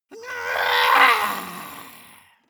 monster growl high; schreie

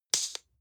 gel dispenser air release; haus garten

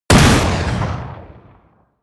blast, hits explosionen metall

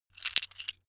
metal pieces clatter short

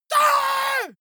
monster growl attack high; schreie

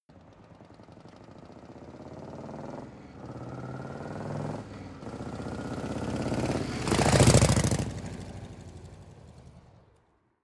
motorcycle harley drive by accelerate

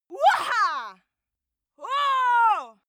female cheer wow; schreie; menschen

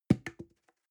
plastic can small fall bounce medium; objekte; dose

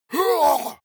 monster growl attack; schreie

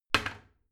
toilet seat slam heavy; haus-garten; badezimmer

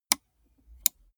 gaming mouse left click single slow; maschinen; buero

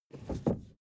suv 1st gear release quick; verkehr transport; autos

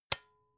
electric whisk switch; haus-garten; kueche

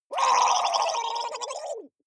water monster female gargle fear; koerpergeraeusche; comedy-cartoon; soundpool

